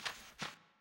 fairy Wing; netent

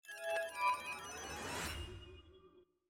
near Win; netent

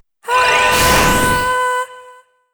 Green Heavy Attack; netent

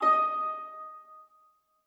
melody Eb5; netent

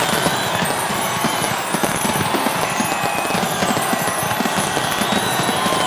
big win amb; netent

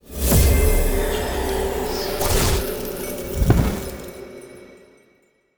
freespin won effect; netent

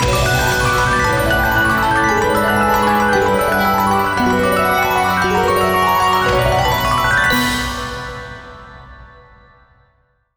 big win; netent

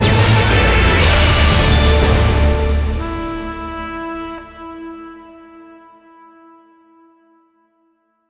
large Win; netent

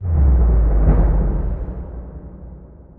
big Win Pre

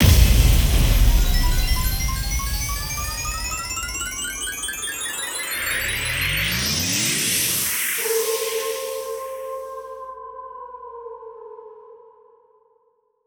bigwin supermega

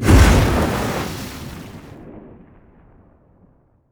yellow lightning strike; netent

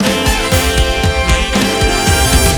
bigwin start

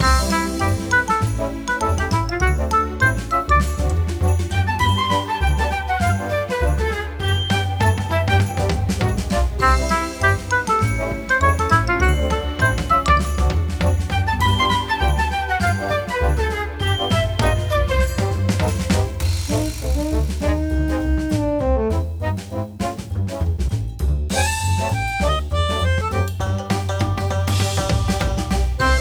Ambience Freespin

